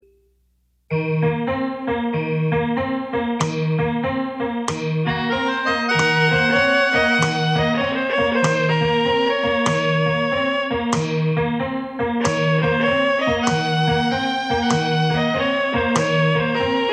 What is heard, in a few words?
Saloon